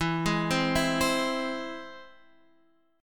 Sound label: E Augmented E down Guitar Half Step Down